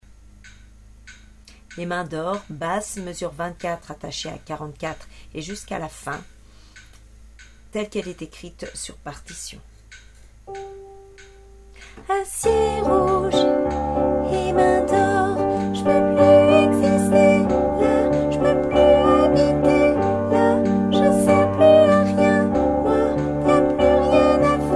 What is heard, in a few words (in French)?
basse